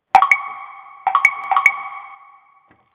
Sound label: Woodsticks, 85